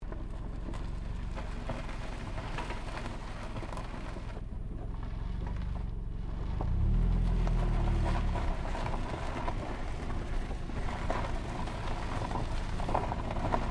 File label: Car Toyota Corolla maneauvering wheel arch gravel, hatchback; 2009 Toyota Corolla hatchback; Cars